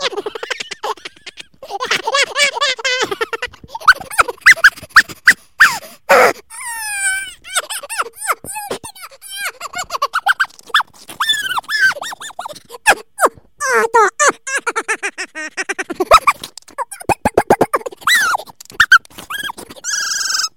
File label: Squirrel Laughing